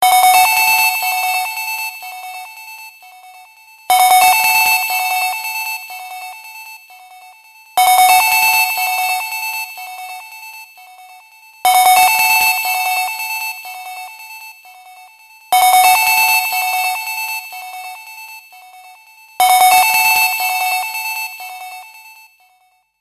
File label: loud ringtone; nokia 6